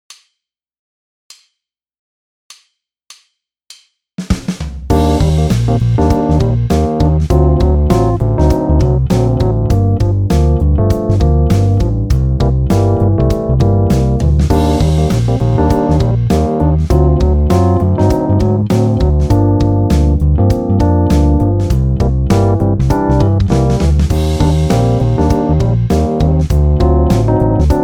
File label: cyclic chord